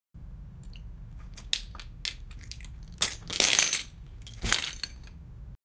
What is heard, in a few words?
sad effects